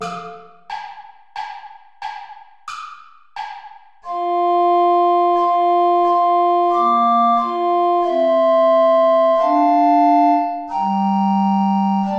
dans une table obscure play along without tenor recorder; traditional